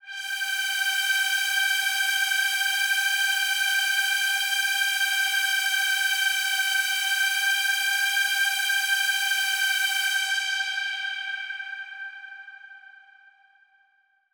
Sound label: Big Pad G4; KG MegaSaur